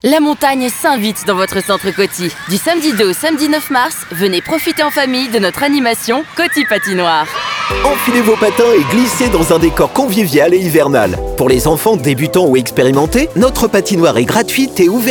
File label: Spot Patinoire music Fun; Studio Com'un Son